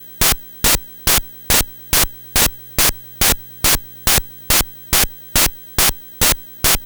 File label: NES Noise Hats Loop; Sonic Foundry (Sony Creative Software)